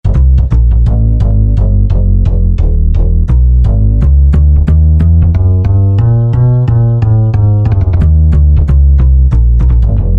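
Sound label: Acoustic Bass; Generalmusic Equinox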